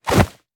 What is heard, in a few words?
melee attack fist; viper